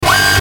Ascend Short Electric Squeal Buzz; Blastwave

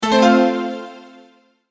multimedia tone advance; Tones; Sound Design; McKinney Sound

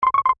UI Commit, User interface feedback sounds

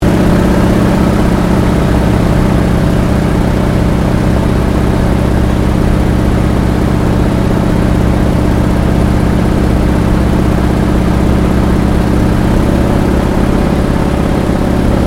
AIRCRAFT CAC WIRRAWAY LANDING INTERIOR STEREO; CA-16 Wirraway; Airplanes

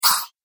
Robotic Droid Noise; Robot Sound Effects

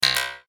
Mouseover Spring Popup; Mouseover Spring Descend Popup; Multimedia; Blastwave

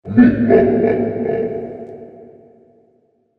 Monster Satisfied Laugh; Monster Sound Effects

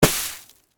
Snowball Impact, Weather, Snowball, Impact, Big; BlastWave

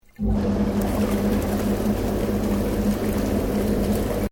Water Bath Spa Bubbling Startup; Domestic water movement; Nature

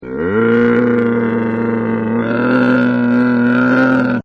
Camel Voice; Camels; Partners In Rhyme

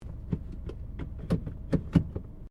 CAR SUBARU LIBERTY INTERIOR GEARS INTO PARK; Family members Subaru; Vehicles

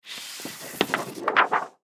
X-Ray Pull From, X-Ray Pull From Envelope; X Ray Machine; Emergency; Machines

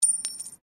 Coin Penny Single Drop Stone; Common coins; Money; Objects

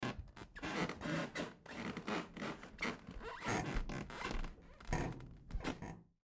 Floor Creaks Wood Basement Perspective; Household; Creaking; Blastwave

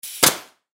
Nail Gun Shoot Thick Wood Single Brad Nail; Power Tool; Blastwave

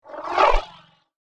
Light Ripple Whoosh; Sfx - Light Ripple Whoosh

